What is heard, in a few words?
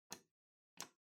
Light Switch Selector Button, Wall Gentle